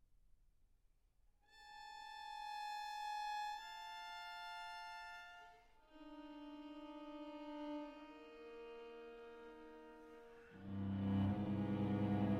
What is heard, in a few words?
String Quartet in A Minor, Lento